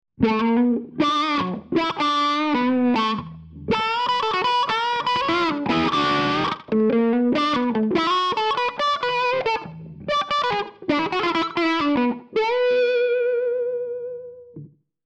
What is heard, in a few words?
Elec Gtr MOD FLTR